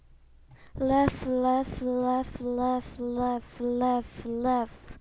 laugh

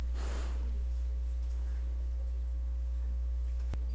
buzz

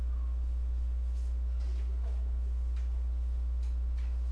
moan

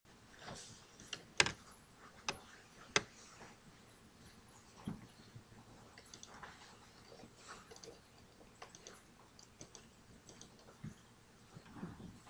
cry

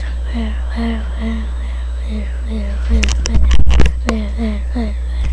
wheel

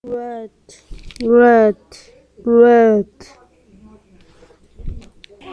rat

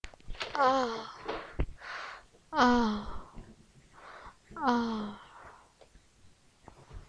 owl

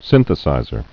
synthesizer

